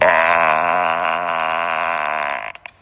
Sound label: sea lion aaa